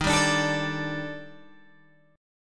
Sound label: D sharp Major Sharp 11th, Guitar, Open G